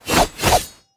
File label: BOSS Atk